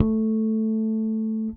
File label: Harmonic A, Bass; Bass X